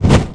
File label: wing